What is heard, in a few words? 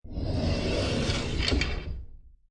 Sliding Door